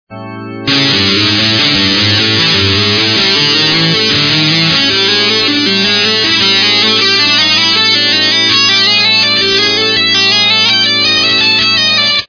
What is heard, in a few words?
slow, guitar